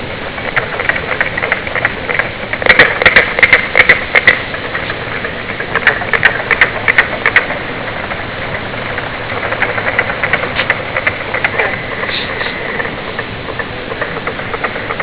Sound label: carpenter frog